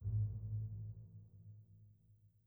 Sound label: chime old; PS4; Chime Sharp